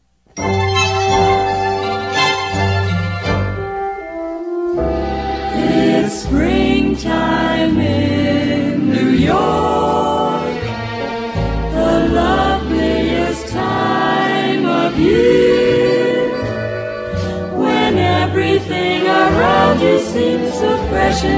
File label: Radio Jingle; WNEW AM 1130